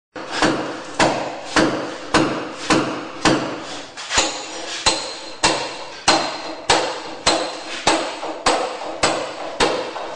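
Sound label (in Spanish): martillo golpeando; martillo gopeando; sonidos insoportables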